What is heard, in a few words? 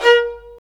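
Marcato, Strings; Vlas Marcato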